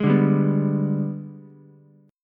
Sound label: Minor Major 7th Flat 5th, Ukulele; G#mM7b5 strummed; JGuitar